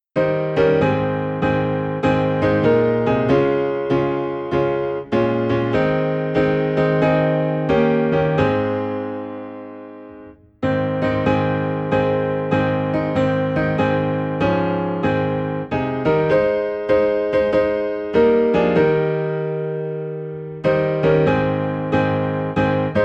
Piano in A Flat; Are You Washed In the Blood

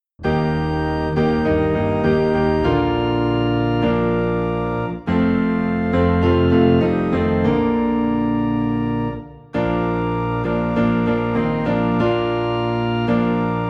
Piano and Organ Low in B Flat; All for Jesus

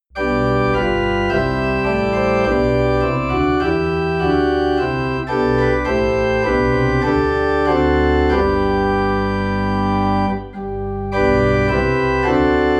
Organ in G

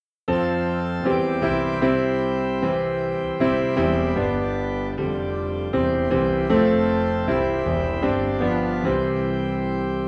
Piano and Organ Low in C; The Solid Rock

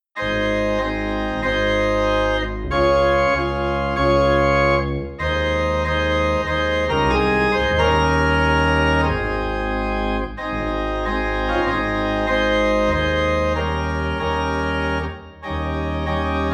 Organ in A Flat

